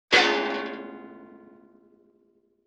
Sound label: Detuned Guitar Strum; Vintage Cartoon; Musical Elements